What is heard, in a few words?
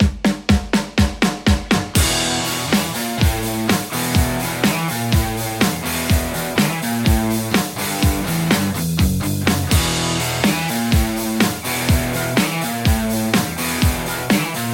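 Telecaster; aradaz crunch v1 4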